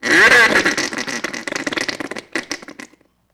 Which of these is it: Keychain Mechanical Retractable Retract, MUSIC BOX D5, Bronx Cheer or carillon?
Bronx Cheer